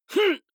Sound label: male fight groan short; comedy-cartoon; soundpool